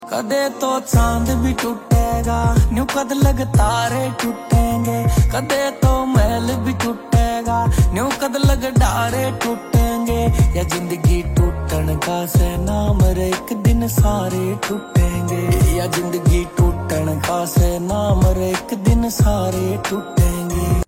Song, ringtone

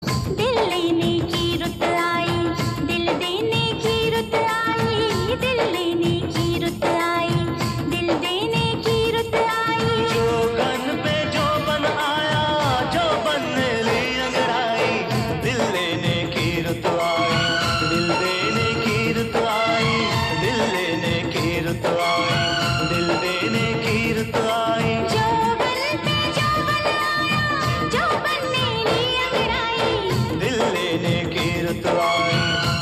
Song, ringtone; Prem Granth

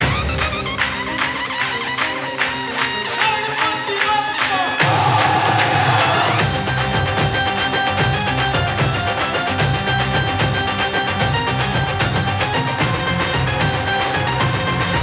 Instrumental Dj Remix

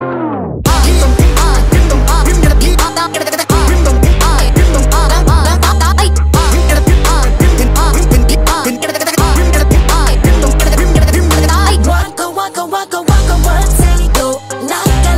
Song, ringtone; Game Changer

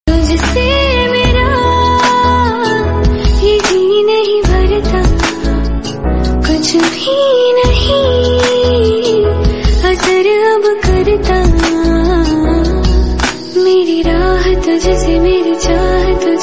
Female Version

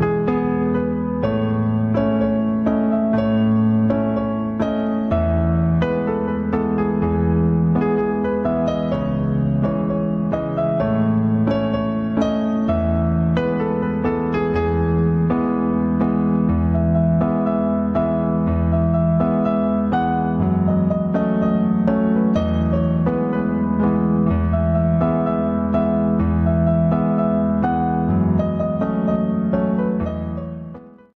Instrumental Ringtone

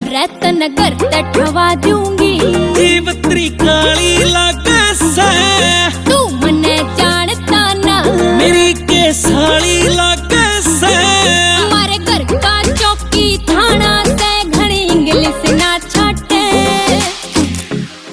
Ringtone

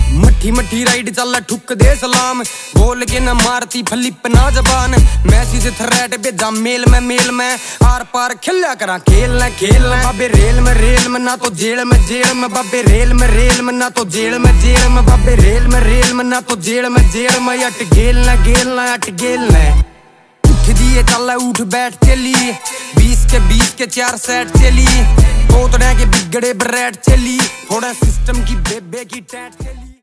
Haryanvi

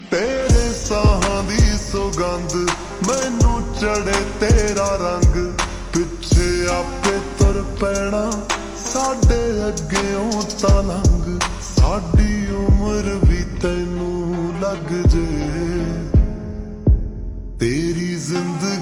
Slowed Reverb

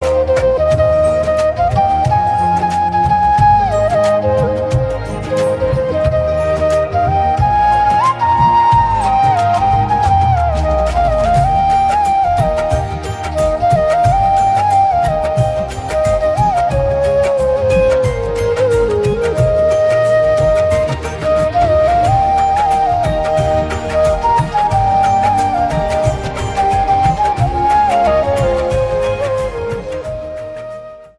Flute Cover

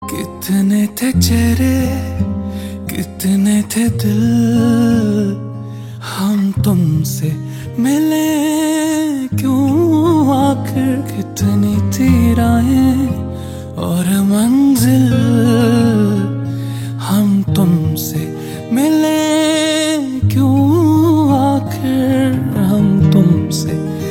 Song Heart Touching

